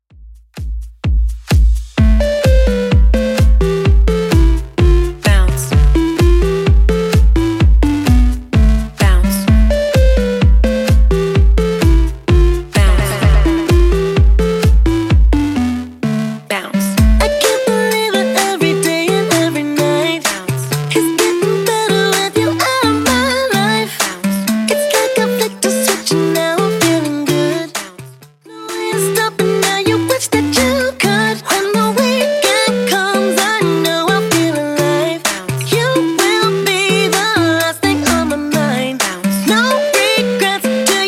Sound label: 2ks Redrum 128bpm